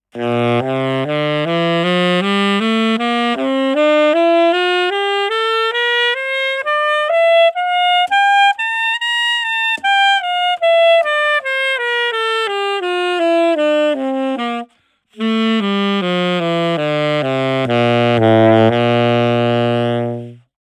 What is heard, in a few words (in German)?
balance tonleiter